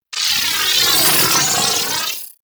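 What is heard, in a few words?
Missile Swarm Projectile By; Warframe; Corpus; Corpus Railjack; Spec Ops Arachnoid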